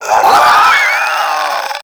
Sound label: Infested Crawler Death; Warframe